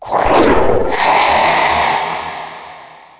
death fire; Hexen II

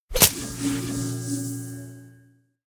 cat splash; splatmagazinerenegadepaintball

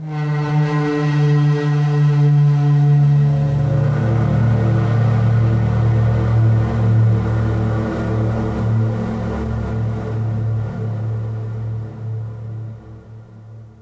ice; DAoC

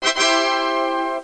TADA

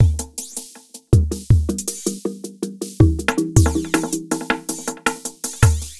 DRUM LOOP ambient 160

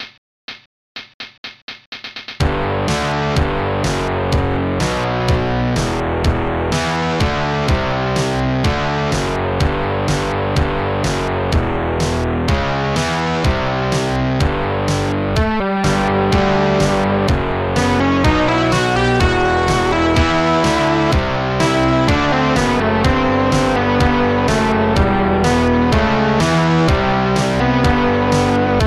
heav beat

